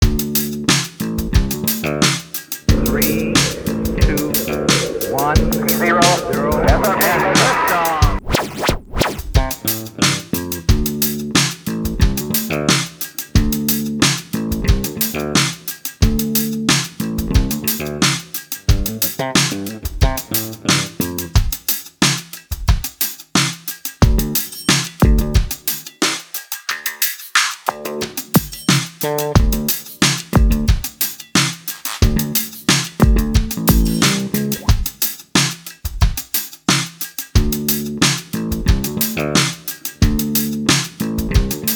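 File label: Rap instrumental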